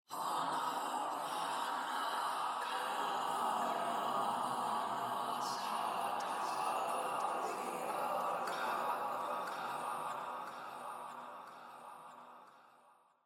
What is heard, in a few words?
banish undead